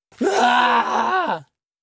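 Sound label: Man Scream Ah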